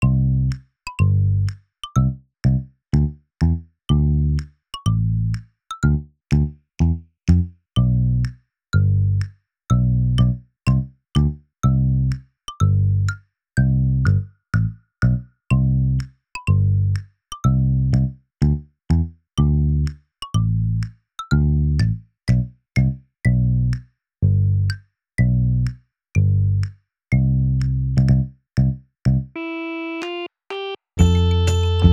バックBGM

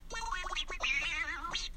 2秒のジングル

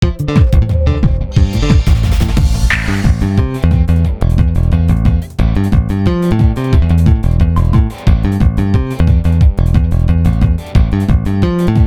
ベース・バンド

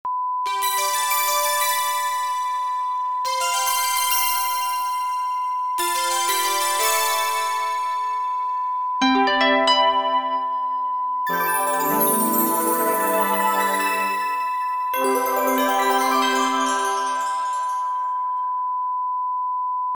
サウンドロゴ

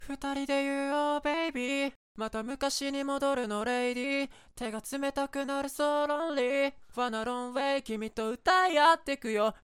ボーカル

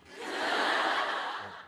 小笑い

